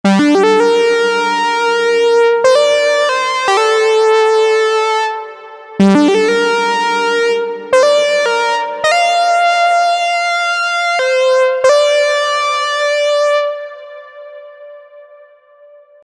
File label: lead saw